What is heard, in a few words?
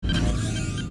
forcefield on